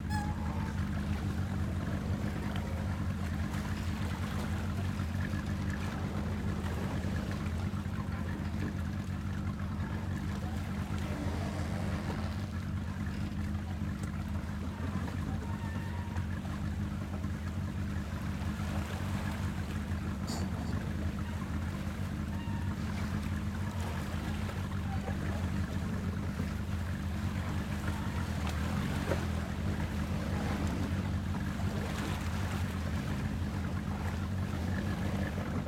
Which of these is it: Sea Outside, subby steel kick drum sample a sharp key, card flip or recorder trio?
Sea Outside